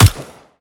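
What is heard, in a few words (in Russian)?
выстрел по мишени во время биатлона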